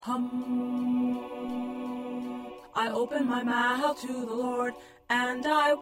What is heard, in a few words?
Tenor, choir parts